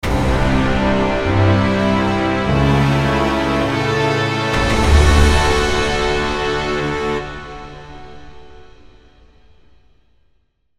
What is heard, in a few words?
mountain orchestra